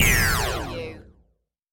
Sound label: Ray Gun Beam Shot